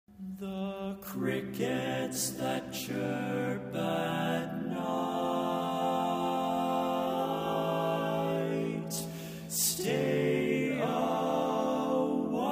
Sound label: Tenor, barbershop